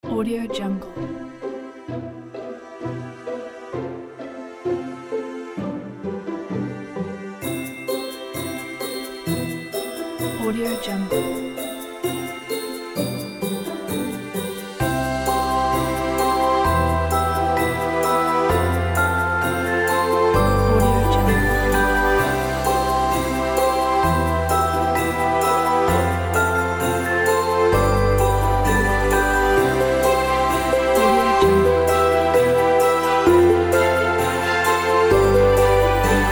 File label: waiting for christmas